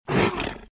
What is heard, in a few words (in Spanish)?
se atraganta